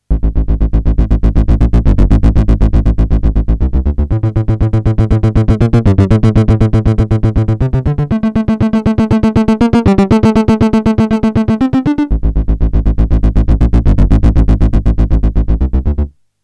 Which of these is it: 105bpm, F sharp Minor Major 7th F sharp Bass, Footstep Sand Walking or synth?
synth